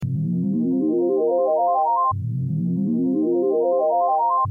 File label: Loop Sound